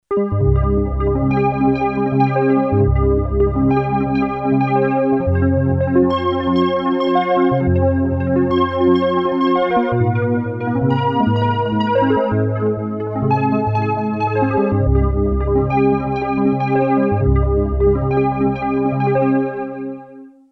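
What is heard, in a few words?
synth easy, analog polysynth; Solton SM100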